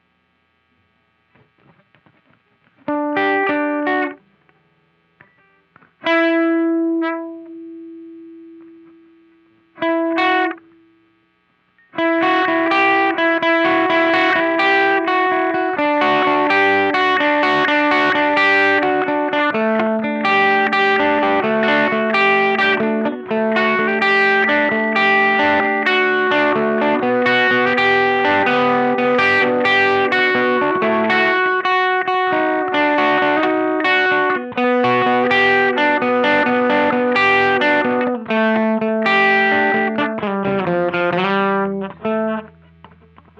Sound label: Riff Slow